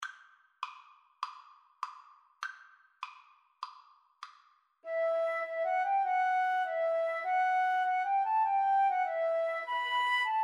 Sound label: deep love, Violin Flute Duet